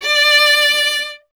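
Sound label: STR VLA D2, strings viola; Miroslav Vitous; Solo Instruments VOL-1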